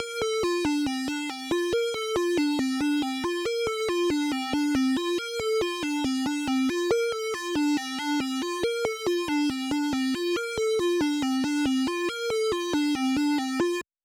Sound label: 139 BPM G Min Arp Synth; Melody; Cymatics; 2022 Melody USB Expansion; Hemp